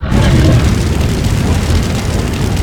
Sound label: Fire Breath Loop; Destruction